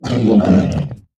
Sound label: Fishmen Death; we are the dwarves gamerip 2016